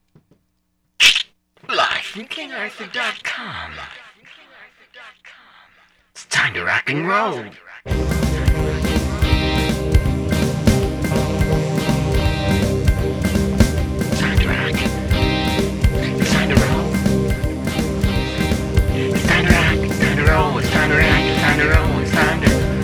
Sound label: Rock And Roll